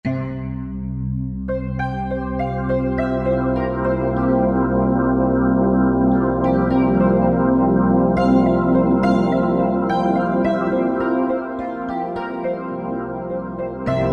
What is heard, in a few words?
Monsoon; Roland SR-JV80-14 World Collection Asia